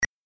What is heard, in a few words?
highlight